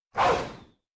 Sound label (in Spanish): Grito de Boltund